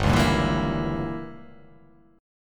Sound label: G Diminished 7th